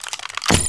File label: motion bomb placing; Original TK Sounds